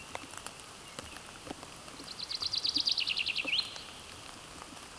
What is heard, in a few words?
Fringilla coelebs